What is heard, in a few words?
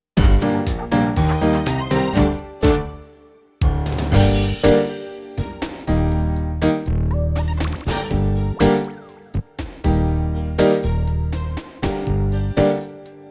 Duet (G minor)